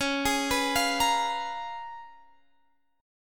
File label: C sharp 13th, Guitar, Drop D